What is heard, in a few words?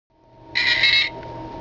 r2d2 sound; ROTS